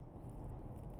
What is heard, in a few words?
Binaural; バイノーラル録音・バイノーラルマイク